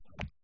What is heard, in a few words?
grab figure; tetris